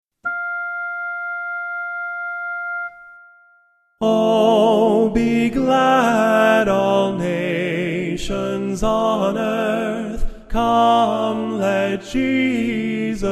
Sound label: o be glad soprano